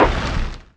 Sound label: asteroid collision metallic small; Factorio